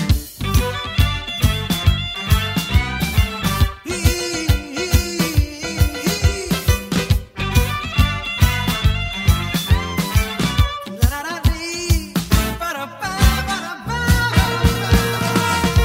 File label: BG Tone